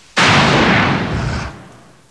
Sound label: explode